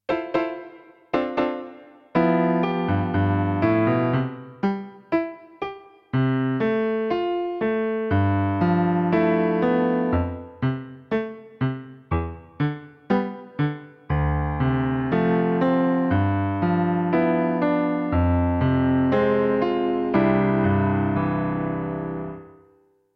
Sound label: karaoke